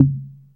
NOISE BLOCK, synth perc; Zero-G; Ambient 1

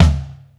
Bo Tom, Tom One-Shots; KJ Sawka - Drum n Bass & Beyond; Drum Tool Box

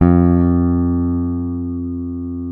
P BASS SUSTN, F2; Sampleheads; Will Lee Bass Library

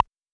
Rim, Vox Drumbox

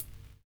LOVE SNAR; Univers Sons

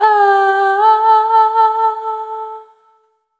Single Voice Melodic Loops, Vocal Samples

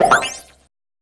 BUBBLEZ LO; Distored Reality

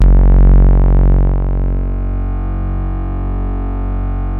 PHAT BASE, BASSES; Masterbits; Vintage Voltage

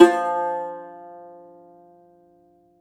Cumbus Saz; E-MU Producer Series Vol. 7 – Old World Instruments

